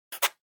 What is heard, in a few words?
Mechanical Pen Check